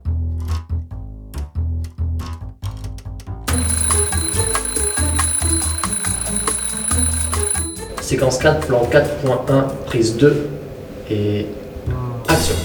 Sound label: PAD